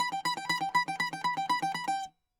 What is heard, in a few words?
bouzouki